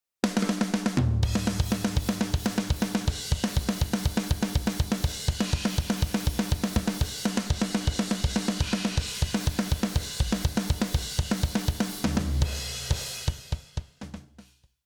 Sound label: drums Distressor; UAD